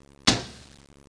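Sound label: door close, fire; disaster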